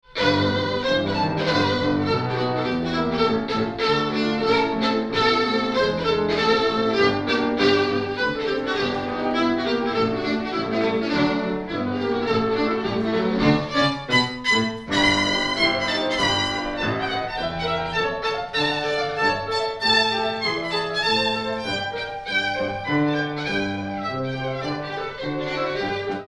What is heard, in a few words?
DPA 4560 Concert